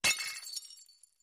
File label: Glass Breaks Light Ce, clay & ceramic